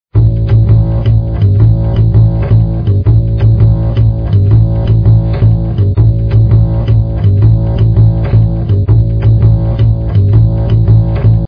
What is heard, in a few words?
bass